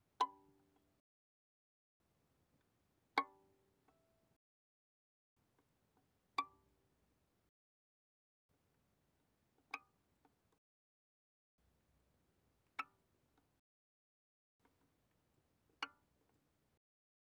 Violin pizz pp sul A B5 Ab6

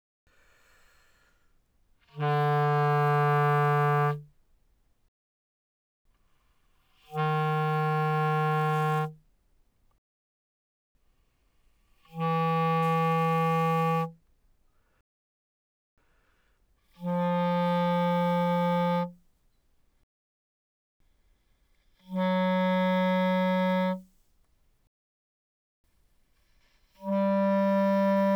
Bb Clar ff D3 B3, Bb clarinet, woodwinds

